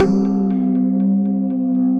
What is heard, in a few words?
Tube ZenBoard, sci-fi effects; Ableton; Mood Reel